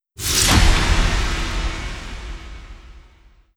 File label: track crash; ExperimentalGear